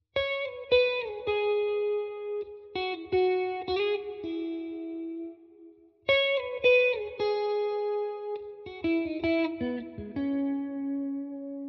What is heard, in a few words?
162 BPM E Maj Guitar; Cymatics; Miami Guitar Pack - Pt 1; GPS